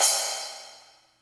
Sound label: Slap Cymbal, Yamaha PSR E305